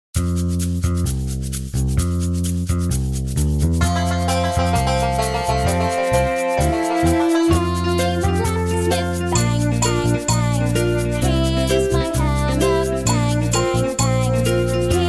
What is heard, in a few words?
Backing Vocals; english primary 1 primary 2